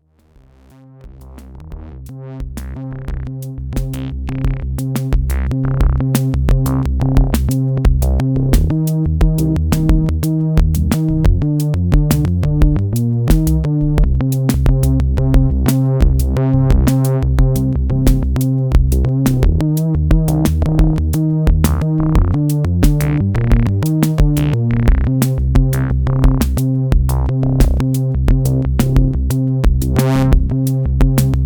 pro1 monotribe live

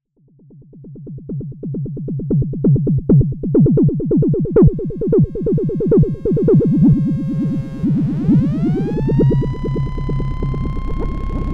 Portamento; Axxe

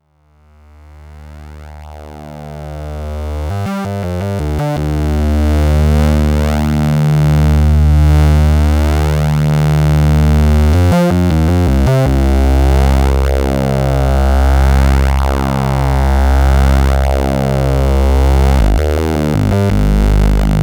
Sonic Six dry VCOs, synthesizer

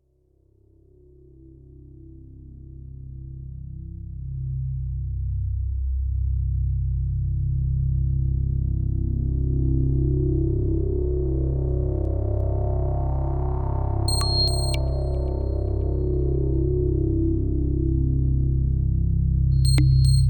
Synthex seq solo, synthesizer

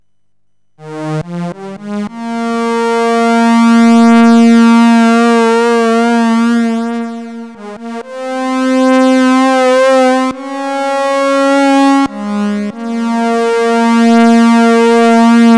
3VCO velocity FM solo; GRP A4

